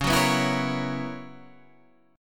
B Major 7th Suspended 2nd Suspended 4th C sharp, chord shape